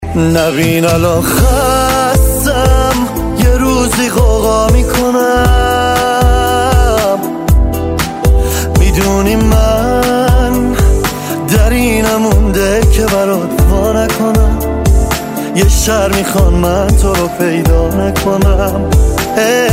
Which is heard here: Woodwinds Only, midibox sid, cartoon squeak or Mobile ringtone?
Mobile ringtone